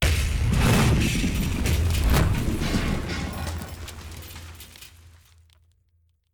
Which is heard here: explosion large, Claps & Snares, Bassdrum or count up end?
explosion large